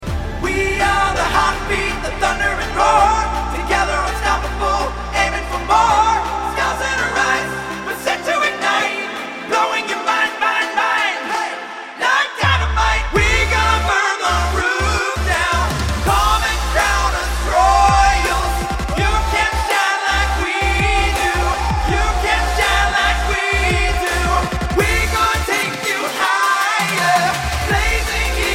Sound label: Sample, male singers